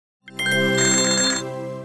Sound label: win high; hotfruits27